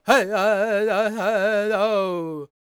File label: C# MIN, World Voices, LAKOTA; Spectrasonics Vocal Planet CD5